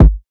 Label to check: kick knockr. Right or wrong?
Right